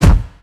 enemy appear smoke

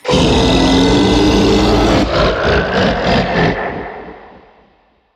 beast vocal spit attack

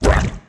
inhale; ragmega

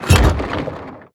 go deeper crawlspace open

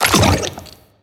cage prep shoot

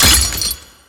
chains break

